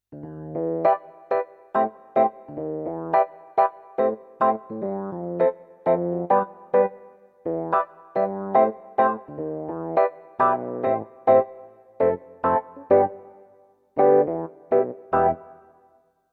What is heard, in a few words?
EPiano; Casio CDP-S350